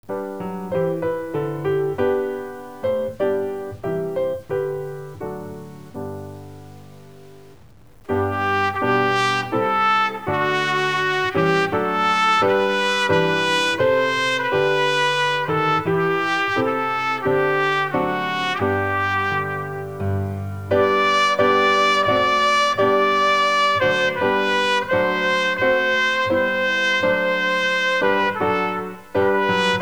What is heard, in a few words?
God Save The Queen; British National Anthem; The British National Anthem originated in a patriotic song first performed in 1745.